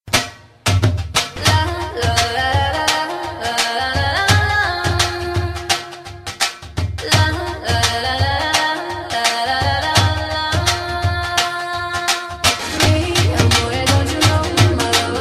Excellent Dhol Music Instrument With English Song Dhol Ringtone